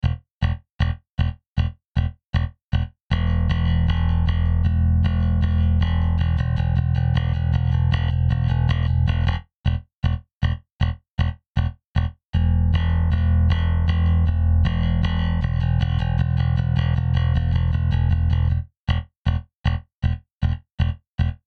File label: Bass DI Punch; Cenzo Townshend